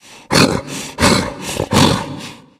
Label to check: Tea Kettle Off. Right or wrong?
Wrong